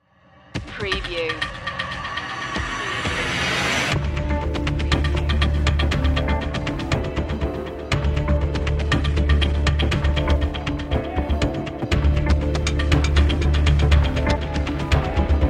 A dark and suspenseful ambience track, with pulsing; ambient; dark atmospheric